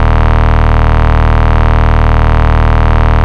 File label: Bass D#; Vengeance Samples; Vengeance Ultimate Bass